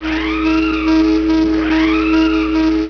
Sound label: Alarm